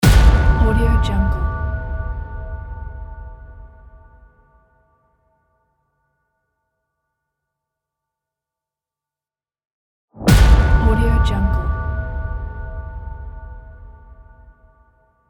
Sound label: church bell hit